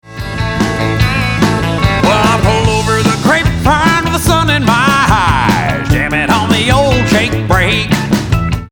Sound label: Jake Brake; over the grapevine